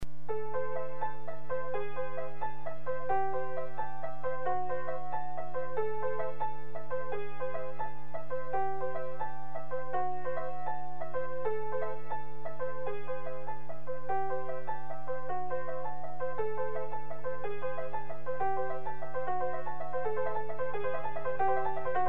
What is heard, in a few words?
A arpeggios